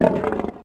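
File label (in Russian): short roar of monster or beast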